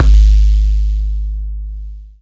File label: Kick hum; Sonic Foundry (Sony Creative Software); Ugly Remnants VOL-2; Beatstuff